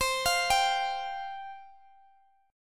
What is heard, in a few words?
C Suspended 4th C, Guitar, DADGAD